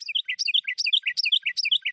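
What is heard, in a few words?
finch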